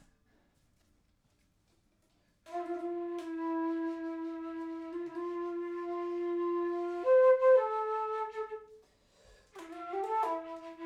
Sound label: Small Bright Combo; logic